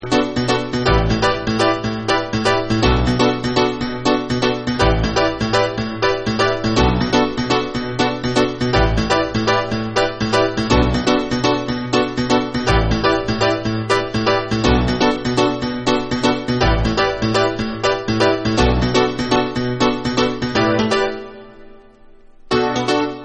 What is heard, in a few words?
Mighty Piano Mix